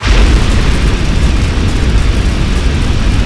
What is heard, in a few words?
Flame Thrower Shot Loop; Weapons